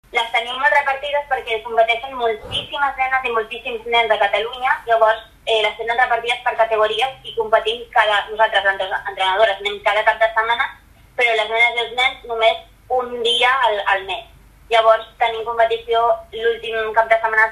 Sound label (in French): Mélodie